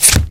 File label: flechette flesh impact; npc; source engine; ep2; ministrider